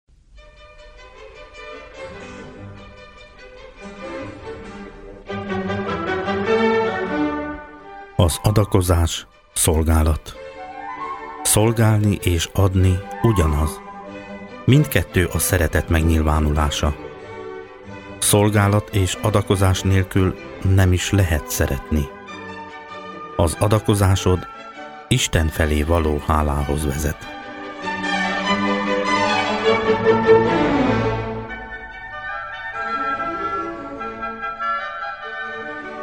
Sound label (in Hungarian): Pénzkérő Jingle